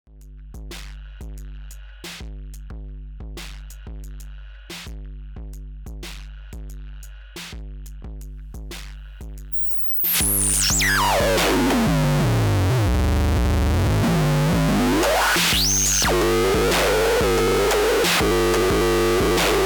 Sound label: vcf1 Distortion Filter Feedback